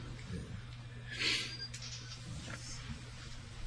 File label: crashing atmos; VC-RV20